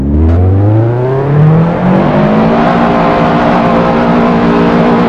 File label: mercedes 190 evo; first; vehicles cars; lwcars